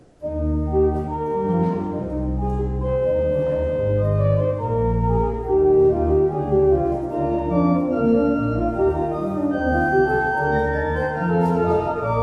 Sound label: Simon